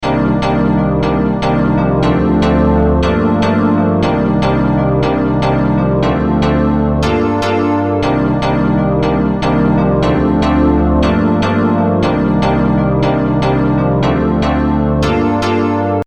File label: Piano